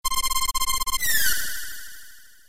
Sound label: safe protect; ff3; ff3us